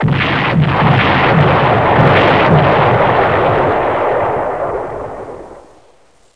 explosion; WESTDEMO

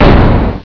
Explosion; BOOM

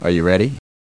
sound ready; Reflux

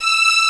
Ens. Strings E6; Hexen Demo; Hexen Music; Hexen 6vox

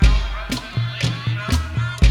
voice loop

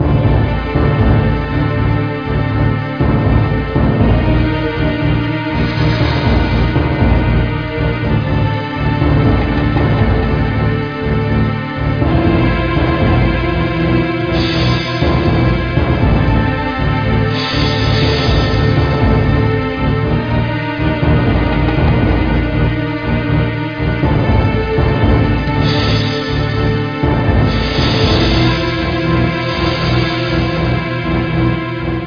battle

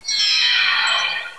wrong; How Would You Survive (1995); Grolier

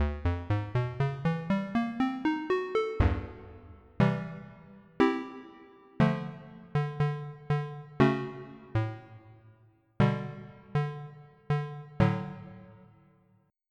Ping; Onj's Soundfont

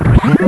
WHISHH

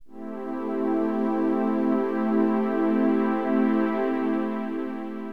JP8 pad, keyboards; HipHop; LiveAct Pool 1

